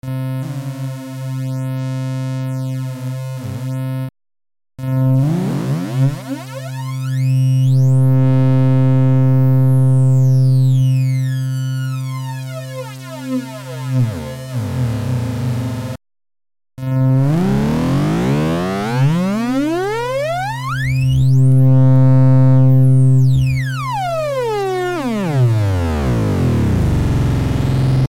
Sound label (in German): kamm plus, synthesizer basics